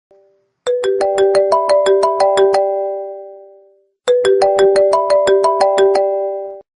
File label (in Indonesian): Nada Dering Iphone 5s Ringtone